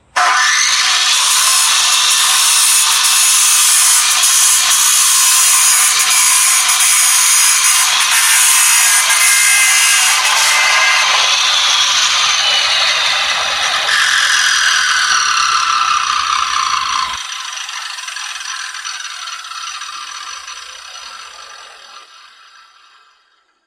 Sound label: pipe cut